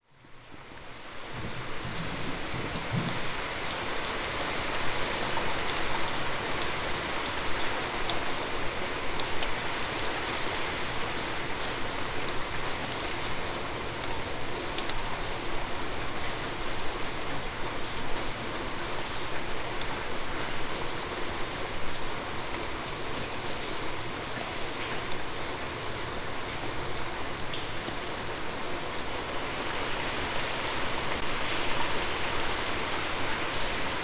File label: Rain, binaural